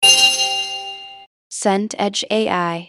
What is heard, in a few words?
success chord